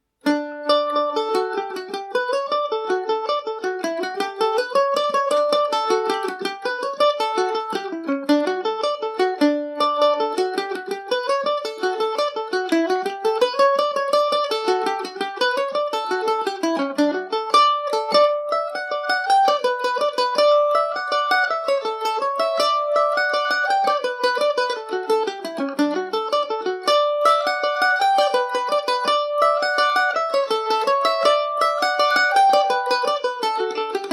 dmaj, mandolin; Benedict’s Rambles; G&O #34 mandolin in July 2021

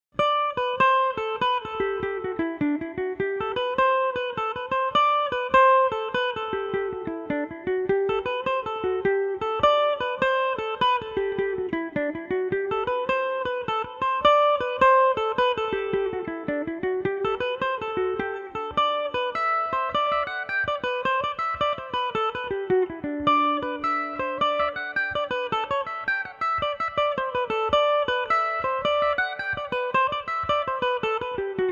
katie sloan gmaj, mandolin

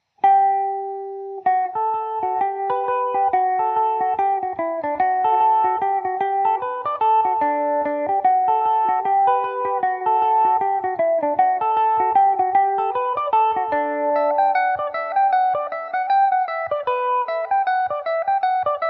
maid that dare not tell dmaj, mandolin

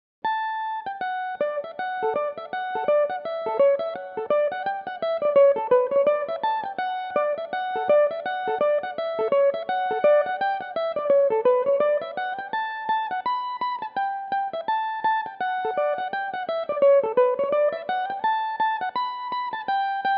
fairy reel, Irish mandolin

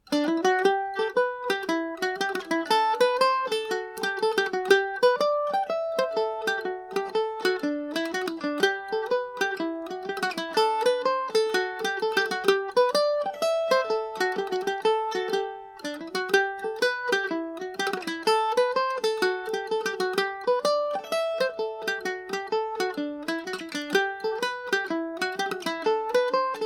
hornpipe, irish mandolin; murphys

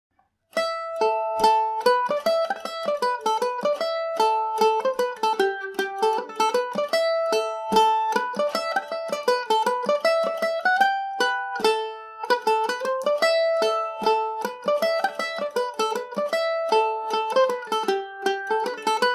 march, Irish mandolin; oneills

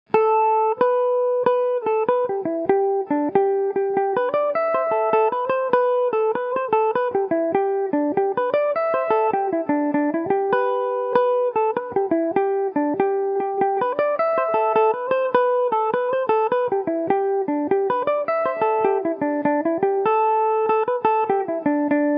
horseshoe gmaj, mandolin; The Horseshoe; Revelation Jaguar electric mandolin

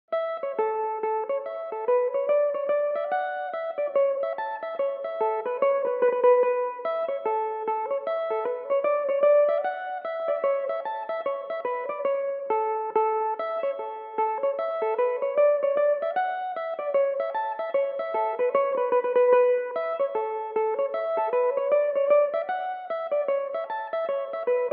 amaj, mandolin

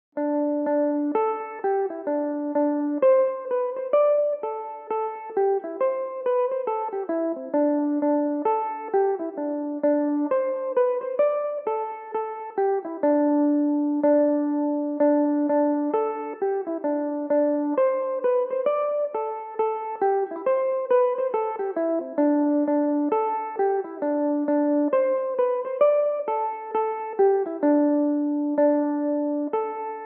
A march/reel set, mandolin; The Song Of The Chanter/The Banshee

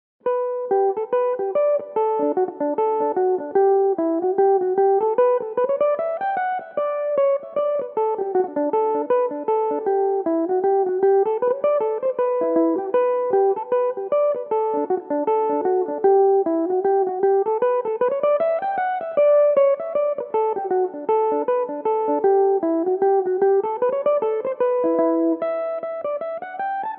reel, E dorian, mandolin

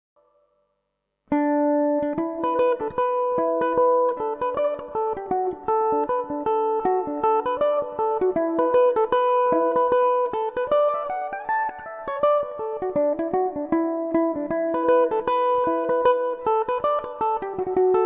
cooleys e dor, mandolin

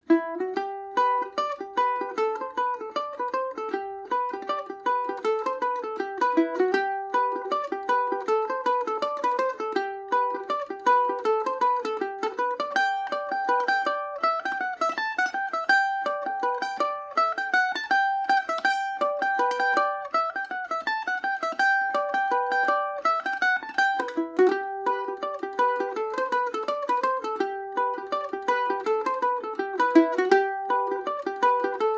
humours of buckfast gmaj, mandolin; Paris Swing Macaferri mandolin

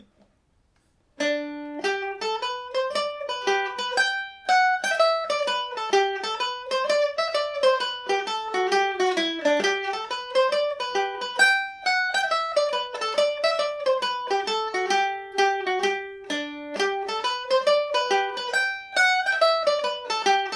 hornpipe, Irish mandolin; hennessys

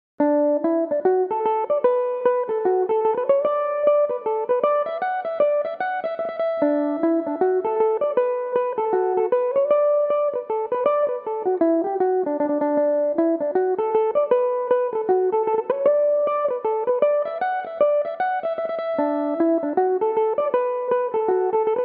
miss monaghan dmaj, irish mandolin

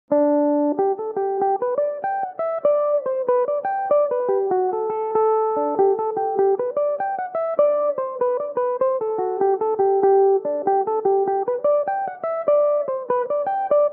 jig, G major, mandolin; The Newmarried Couple; Belmuse EM-190 electric mandolin

